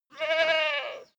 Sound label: goat baa high male